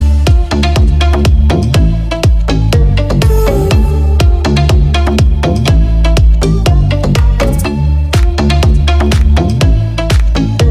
Amazed Music

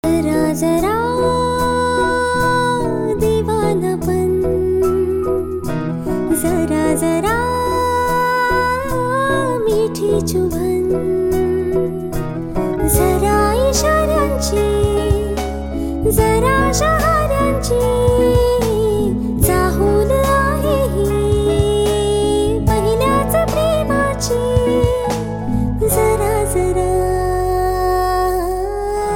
Female Version Marathi Ringtone

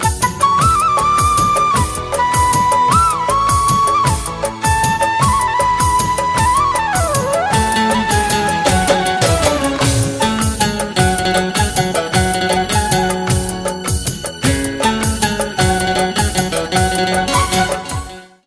Song Flute Tone

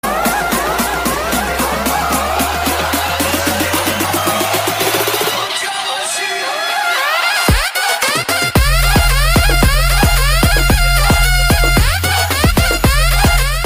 marathi ringtone

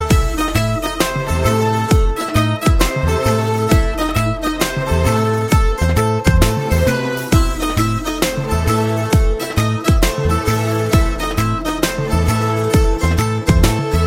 BGM

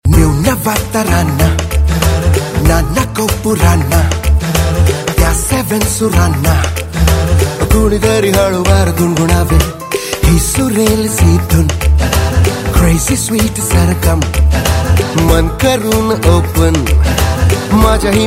Marathi Ringtone

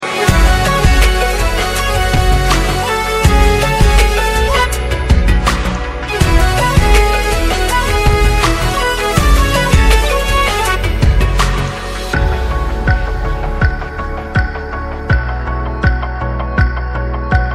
Instrumental Tone

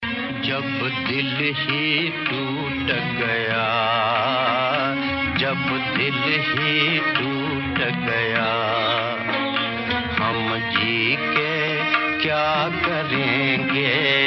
Ringtone

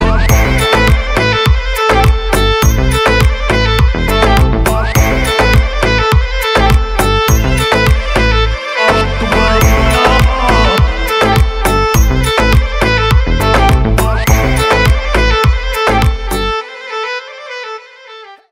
Instrumental Ringtone

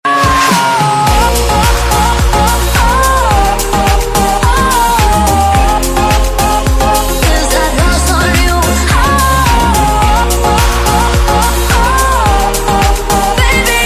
Remix English Ringtone

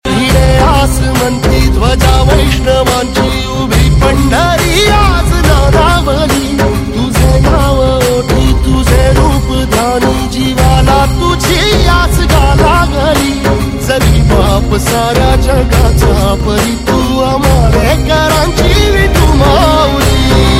Marathi Ringtone

